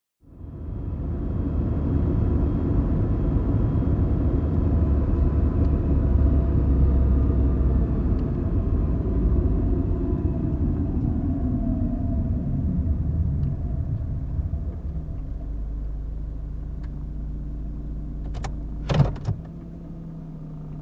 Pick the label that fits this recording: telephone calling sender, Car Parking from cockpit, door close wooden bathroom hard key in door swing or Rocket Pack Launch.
Car Parking from cockpit